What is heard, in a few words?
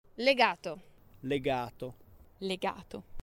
Legato